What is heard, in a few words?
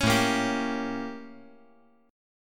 A 7th Flat 9th; 6,9,6,10